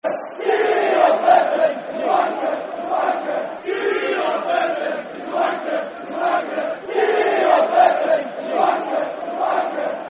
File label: Football Chant (Soccer Song), Rotherham; Rotherham United Rufc